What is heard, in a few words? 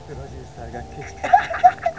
cheap laugh